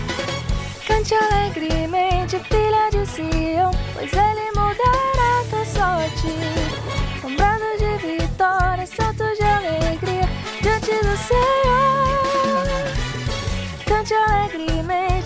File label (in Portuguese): SOPRANO